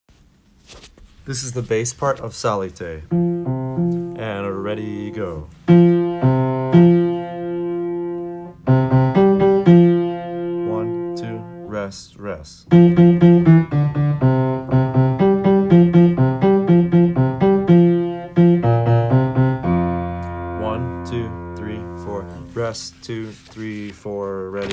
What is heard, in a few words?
bass, choir; psallite